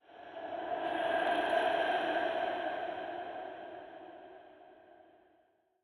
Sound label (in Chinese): 灵魂沙峡谷幽魂声; ambient